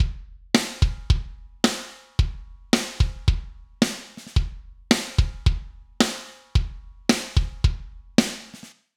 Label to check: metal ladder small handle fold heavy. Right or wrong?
Wrong